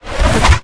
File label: jump; argus; smite; hera